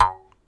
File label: jaw harp recorded live oneshot